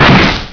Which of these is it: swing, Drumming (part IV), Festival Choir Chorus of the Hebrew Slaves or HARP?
swing